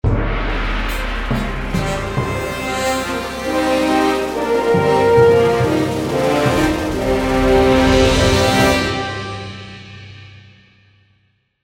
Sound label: Large Victory; CivCity Rome